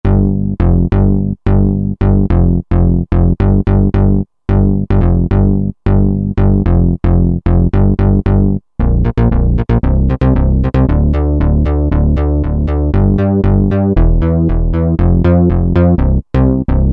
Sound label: ClassicMG Bs; Roland SR-JV80-04